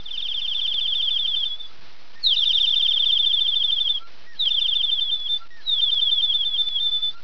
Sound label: Bird chirps